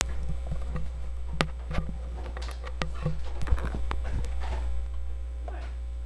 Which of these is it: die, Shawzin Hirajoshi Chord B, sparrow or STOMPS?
sparrow